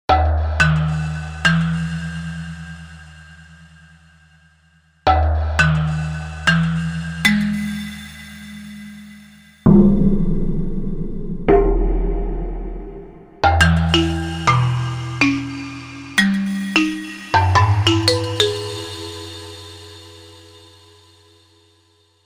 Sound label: Mallet Fsx, Korg MSC-1S; Korg MSC-1S Variety